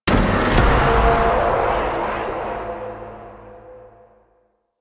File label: Puzzle Fail; Ambience; Orokin Moon; Warframe